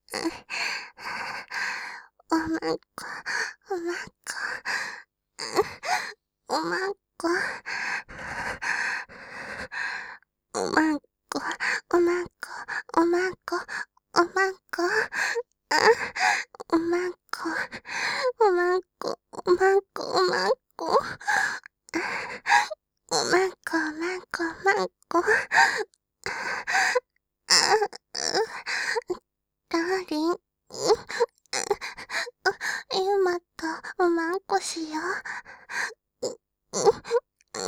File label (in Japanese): 喘ぎ; お屋敷メイドのハーレム淫語ヘヴン 気が済むまで耳舐め淫語を堪能できるバイノーラルオナサポ音声